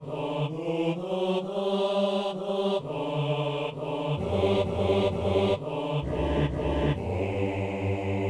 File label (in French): exultet basse